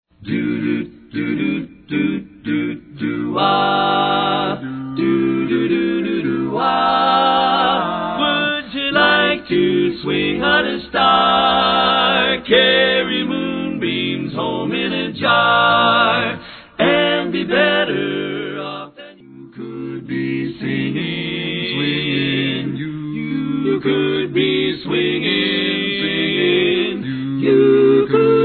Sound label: swingin